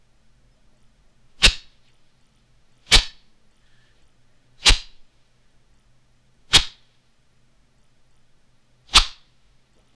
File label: wrestlers pain sounds; wrestling